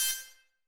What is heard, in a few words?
Error; LFS